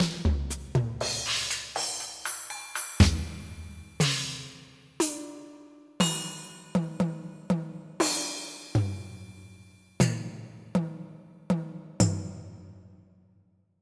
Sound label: Metl Drums Dry